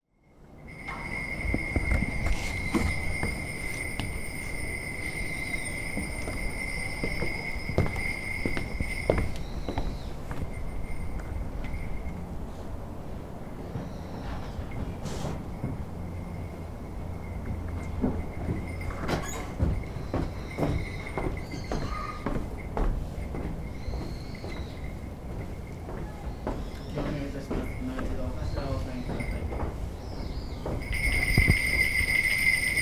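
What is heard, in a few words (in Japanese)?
kairou wo aruku kigan suzu kitou, walking the corridor, prayer bell, prayer; 回廊を歩く 祈願（鈴 祈祷）; Chino Cultural Complex; 茅野市民館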